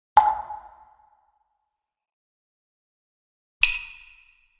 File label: raindrop